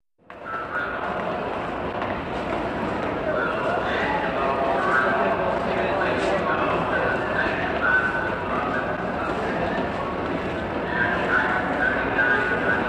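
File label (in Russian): шум пассажирского вокзала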